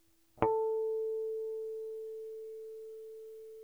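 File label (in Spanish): La armónico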